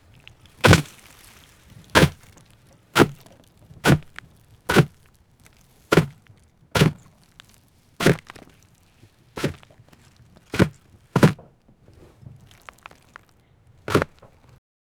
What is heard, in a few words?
sound of effort when farm, agriculture